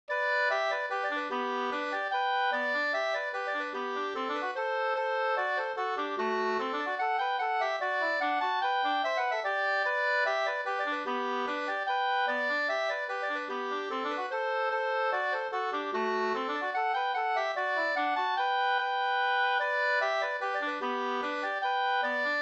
hambopolkett; fr Sd e Ulf Lundgren 2st

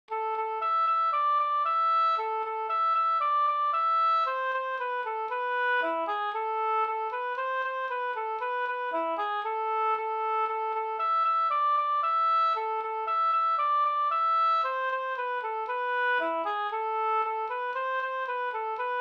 langdans ringdans; fr Sm Ahlstrom

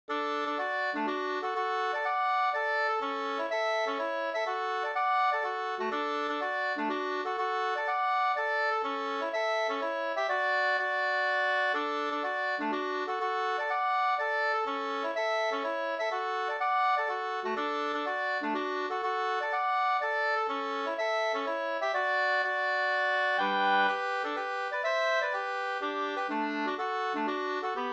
hamburska, 2st; Annas polska Taxinge Sd

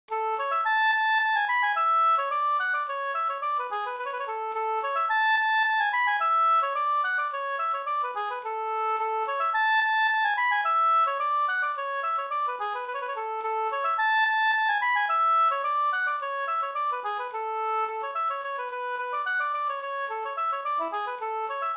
brannvinspolska; Brännvinspolska efter Lorens Brolin, Abbekås, Skivarp, Skåne, ur Svenska låtar, Skåne, nr 1004

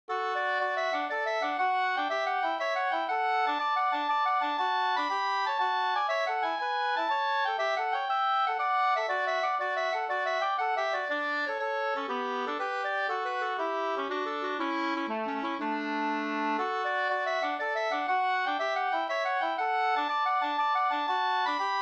polska

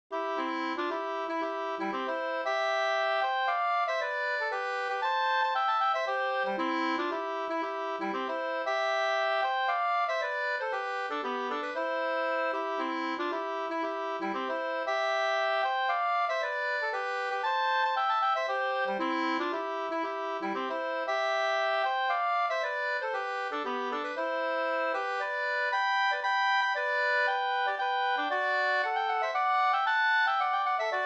polska Bodapolska

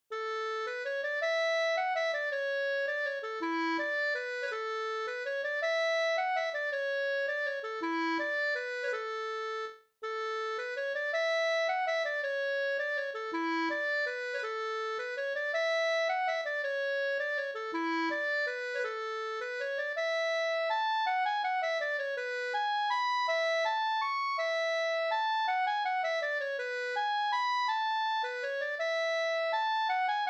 vals

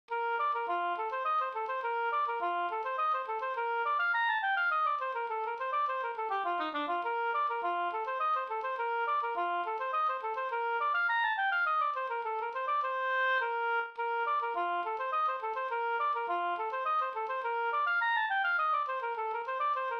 slangpolska

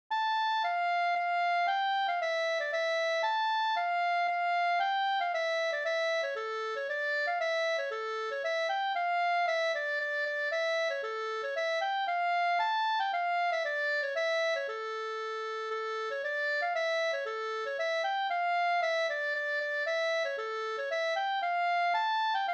polska Bodapolska

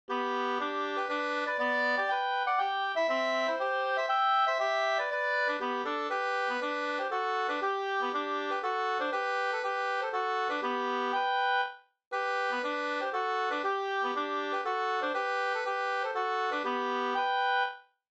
vismelodi bröllopsvisa; Vingaker

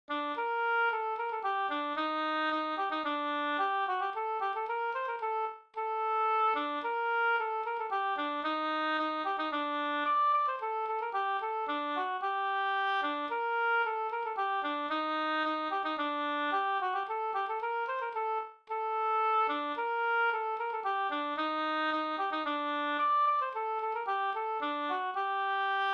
polska G moll trea

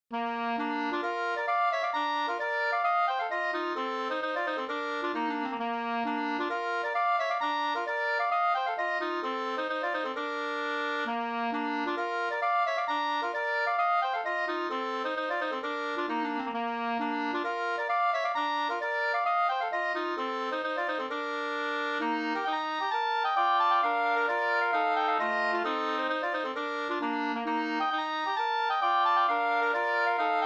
hambopolska

